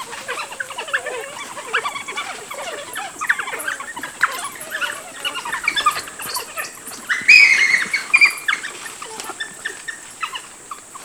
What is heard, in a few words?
greet